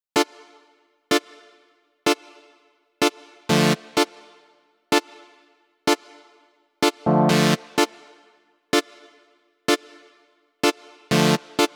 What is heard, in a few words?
126BPM Stab Root D; Vengeance Samples; Inception Kit